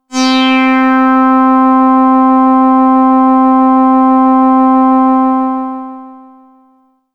Sun Sigh D, synthpad

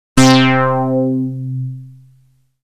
Moogli-Bass C

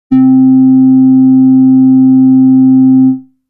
Solar-Flute A

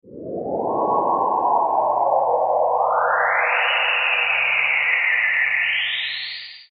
Flying saucer

